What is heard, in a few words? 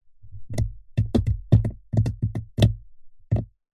industrial adhesive paste in plastic tub drips test consistency